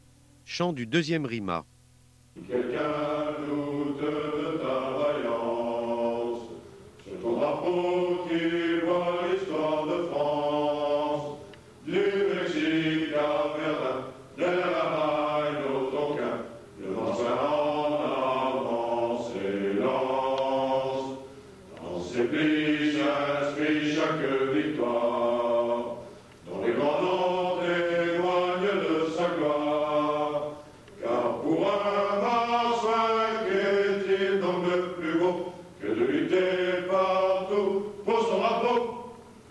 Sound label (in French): Chant; Troupes de marine